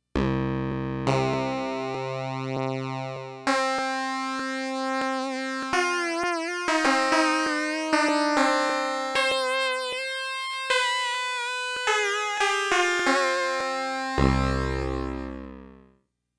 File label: Sitar